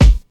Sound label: loud hip hop kick drum single hit g key